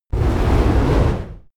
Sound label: flamethrower short single